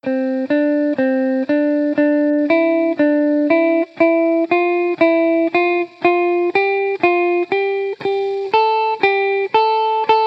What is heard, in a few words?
scale example